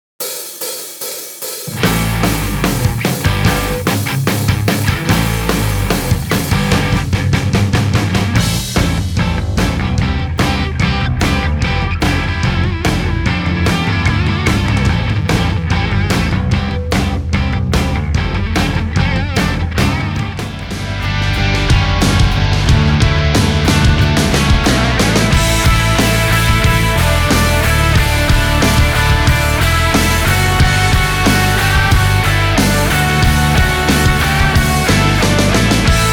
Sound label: rock; big fish audio; the rock collective; kontakt